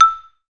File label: XYLO E5; E-MU Formula 4000 Series Vol. 5; Protozoa; Proteus2 Instrmt